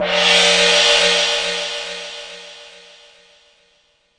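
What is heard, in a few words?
Yamaha RS7000 hihat cymbal, Drum machines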